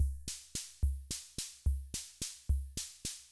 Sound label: Kay R-12 Rhythmer Loop, Drum Machines; Kay R-12 Rhythmer Sample Pack; 300 Drum Machines